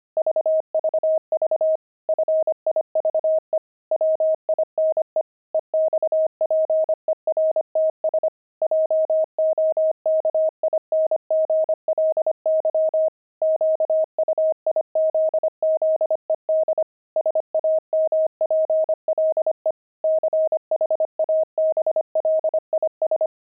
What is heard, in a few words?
pangram, intermediate pangrams files; wine